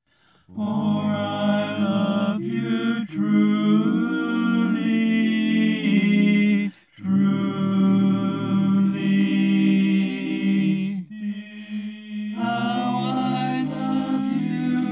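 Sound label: baritone, barbershop tag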